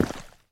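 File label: step water; step on watery ground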